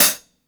HH, HI HATS; Basement drums vol 2

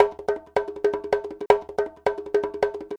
Conga, Latin Percussion

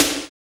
BOYS SNR, TECHNO; Drums In Your FACE!

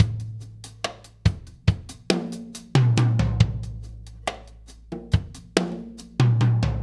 Earth Loop, Drum Tools, Laid Back

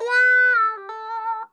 SPINDLY, Funk Guitar; Zero G - Funk Guitar

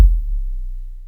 Kick, Drum Machines

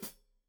Hat Foot, Hi-Hat, Cymbals Stereo

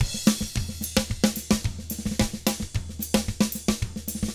SMBA, 110 SAMBA, Drums

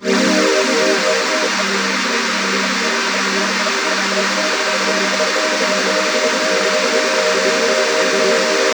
Eb, Pads; Zero-G; Total Drum Bass

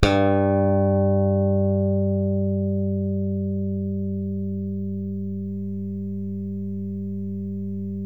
SLAP BASS, Electric Basses

